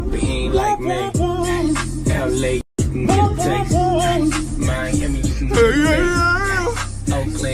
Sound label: wompwompwomp